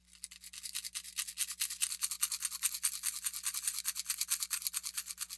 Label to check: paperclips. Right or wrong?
Right